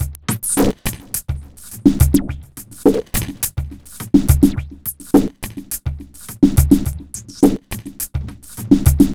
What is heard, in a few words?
dirt beat; Sonic Foundry (Sony Creative Software); Ugly Remnants VOL-2